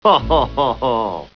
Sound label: chuckles